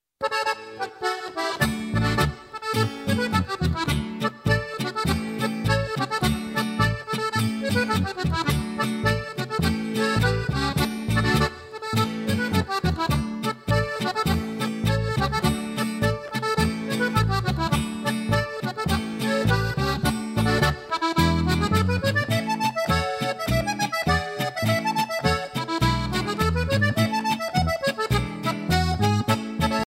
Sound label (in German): Akkordeonspielereien, Boarische